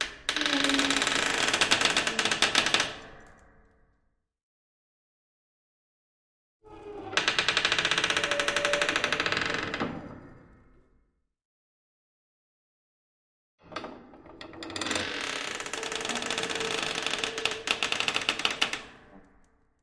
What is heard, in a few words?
Trap-Door, Heavy Creak; hinges & creaks